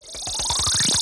SFX - Powershot Ball Effect; Pangya; Fantasy Golf

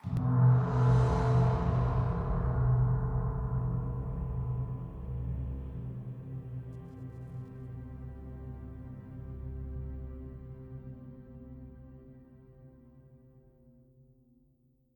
Mus Multi Jingle Gamestart; Chronicles of Riddick Assault on Dark Athena

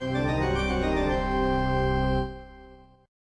medium task complete; Morytania

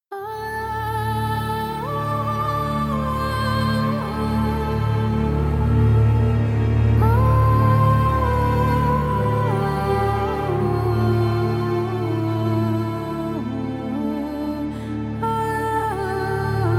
Mood Music Drama; The Witcher 3 Wild Hunt; Skellige

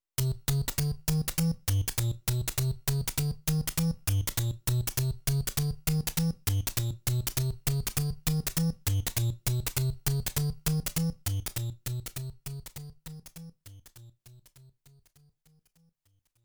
Slot Screen BGM; super mario world live

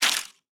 Thrash; Trivia Crack; Android

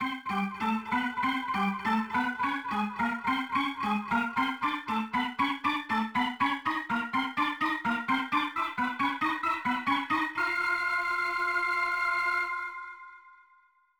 Organ; BGM; dreamcast 2000; world series baseball 2k1

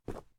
Snow; This Is the Police 2

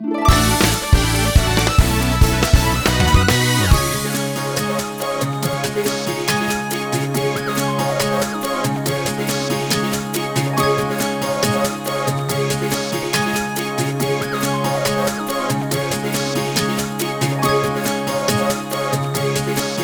Jingle World Clear; Super Monkey Ball Banana Blitz

